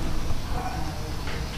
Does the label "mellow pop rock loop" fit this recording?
No